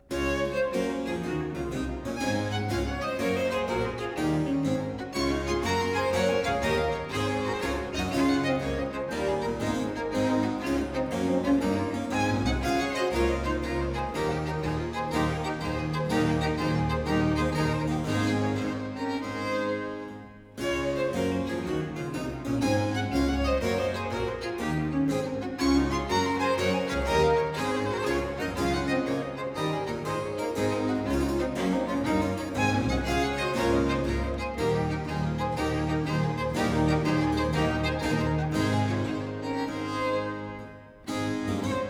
Canon and gigue, for 3 violins & continuo in D major; German Chamber Music before Bach